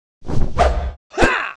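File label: ATTACK, Monster; Champion; 금전방; 무적폐랑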